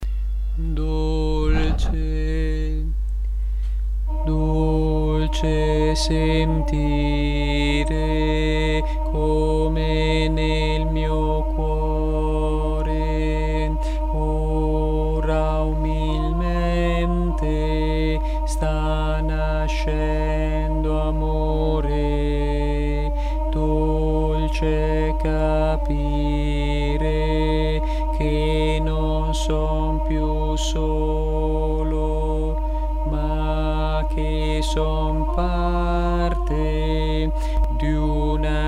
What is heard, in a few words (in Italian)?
contralti